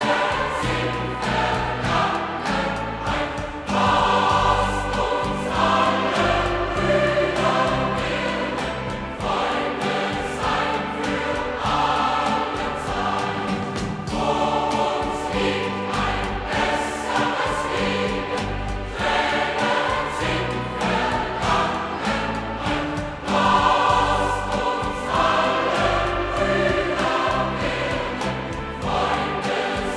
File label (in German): Chöre